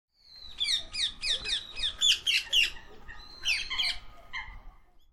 honsei, shakujii, koe

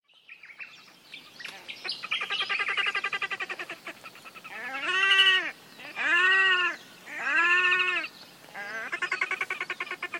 akaerikaitsuburi, koe; ikeda; hanada

